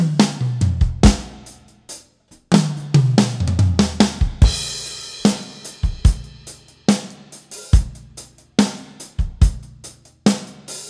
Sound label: RX4000 3.0s Drums